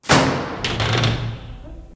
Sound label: open, door, metal; open close